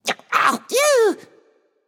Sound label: ugh, voicepack; smite; jorm